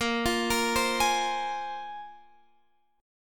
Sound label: A sharp 7th Suspended 2nd A sharp, Guitar Drop D